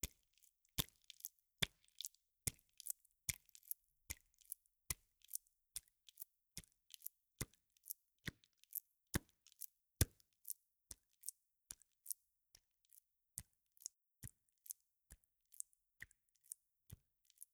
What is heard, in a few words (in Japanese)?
ぱんぱん